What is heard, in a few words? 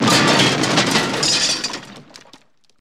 Metal Crash Med Debr, ransack room; Metal Crash, Medium, With Debris